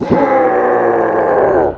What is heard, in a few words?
ROAR; war3source